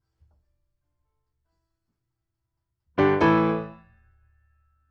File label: Piano Ribbon